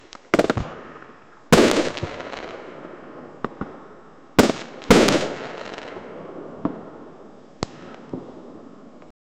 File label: fireworks from a children